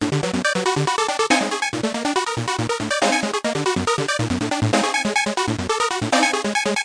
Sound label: 140 dissonant freak melody; SYNTH AND ELECTRONIC LOOPS